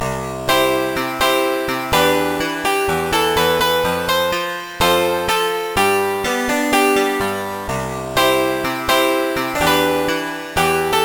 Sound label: true piano; Trackers; Modland